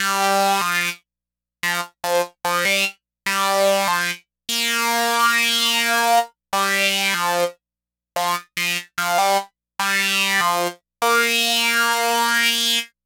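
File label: synth sweep riff; Riffs & Solos; Dr. Fink's Funk Factory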